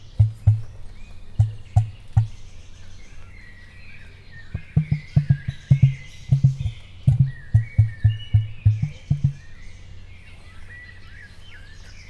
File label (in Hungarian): két részletben, emu; miskolc zoo